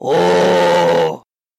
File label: ohhh